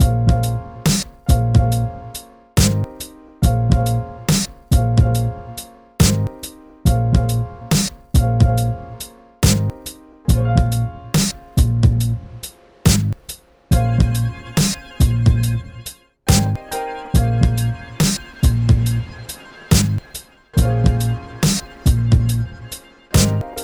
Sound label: beat